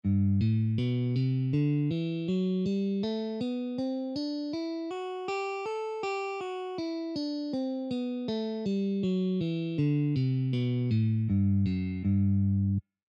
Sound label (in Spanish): escala de sol mayor posición 2